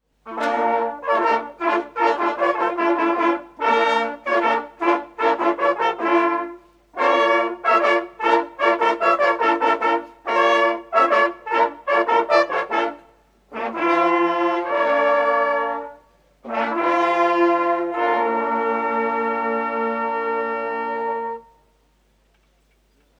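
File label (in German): Sau tot, Jagdhornbläser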